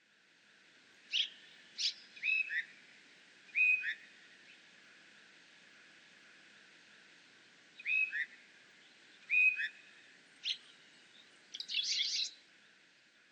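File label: koshiaka, Red-rumped Swallow; koe; mooka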